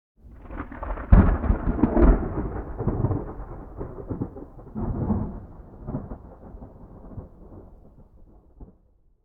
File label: Thunder moderately close; boom library; Thunder And Rain